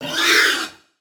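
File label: wild boar, nakigoe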